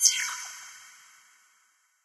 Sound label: no win; European Roulette; Evoplay